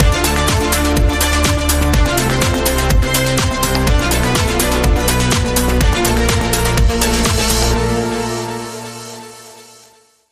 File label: Link Sting